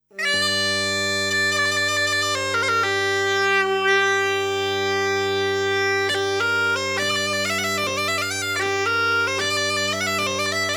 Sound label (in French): Bourree; Bourree d Ambrugeat